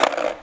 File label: Wheel clack